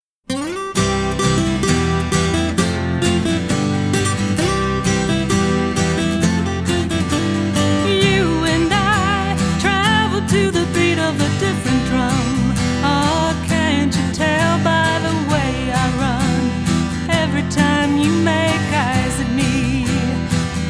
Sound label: acoustic band